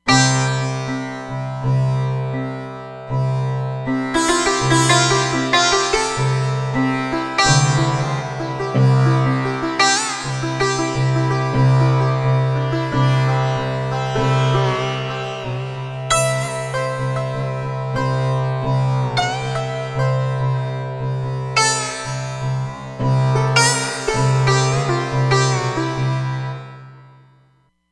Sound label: Sitar on C, Roland Fantom XR